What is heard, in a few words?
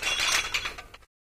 roll; video games; player; taunt; soviet strongarm; source engine; tf